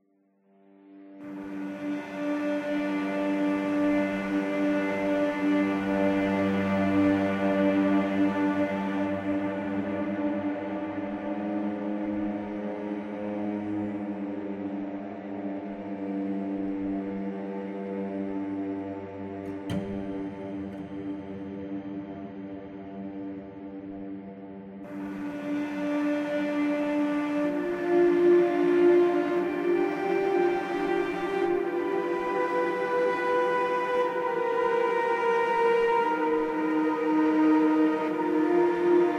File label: Cave Music; Solus Project